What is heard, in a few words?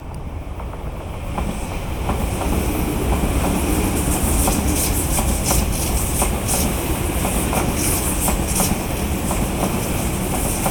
Binaural; Field recrding walk